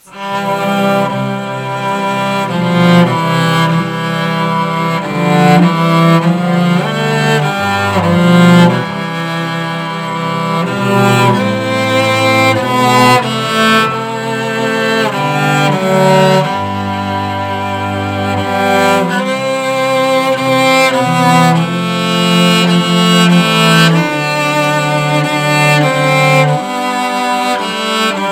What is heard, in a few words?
Cello